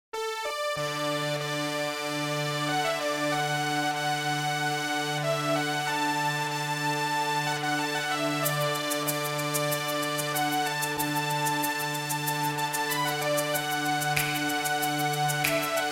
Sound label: Bagpipe Reggae